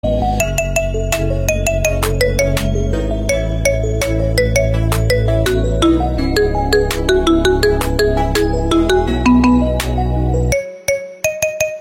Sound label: iPhone 11 Sleep